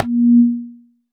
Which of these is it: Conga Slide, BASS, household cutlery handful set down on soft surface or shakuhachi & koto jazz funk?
Conga Slide